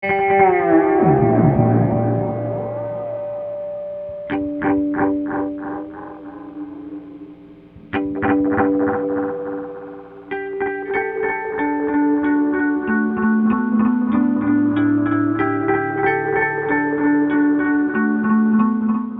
nice pickin, ghost delay

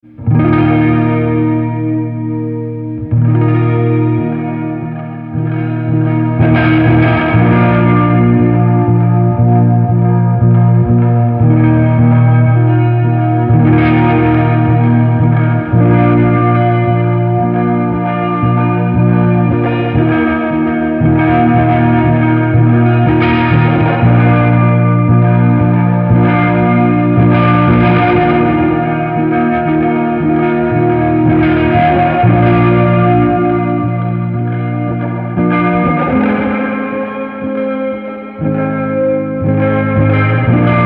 slow waves; deathbyaudio

